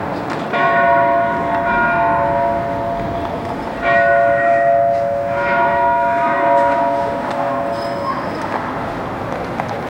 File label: gothic church bells tolli; horse hooves